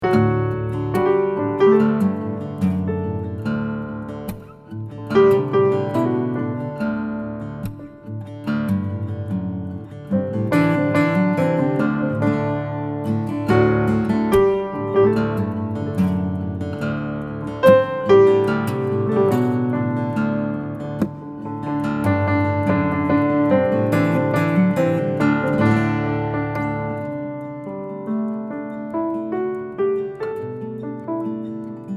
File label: Folk march